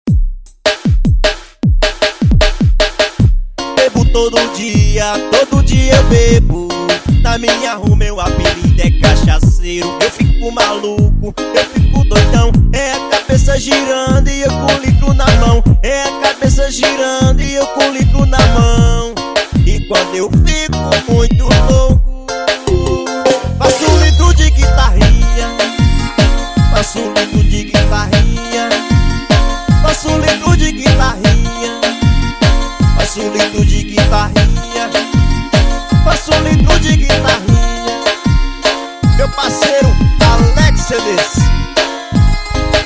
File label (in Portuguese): guitarrinha